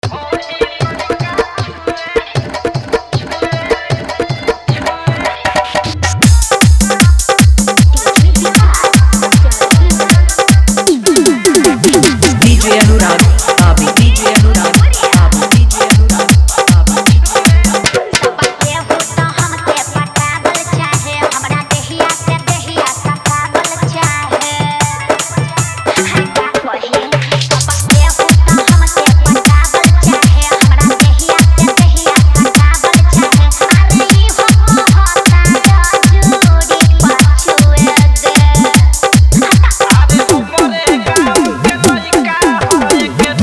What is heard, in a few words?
Arkestra Dhollki Bass